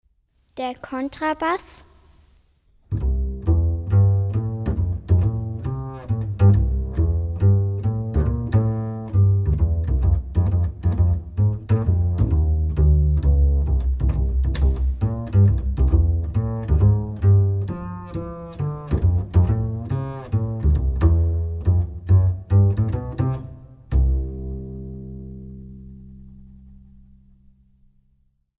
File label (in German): Kontrabass; Bilder einer Ausstellung